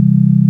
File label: sine; 音の波形と分析